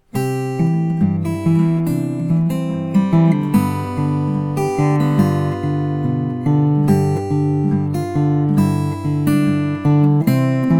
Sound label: mic test, banjo